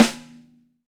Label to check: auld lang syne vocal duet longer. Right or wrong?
Wrong